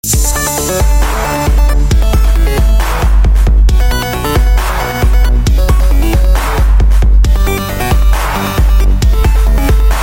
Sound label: Canon In D Dubstep